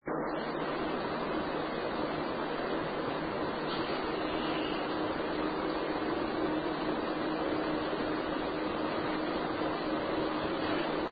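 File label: museum noise door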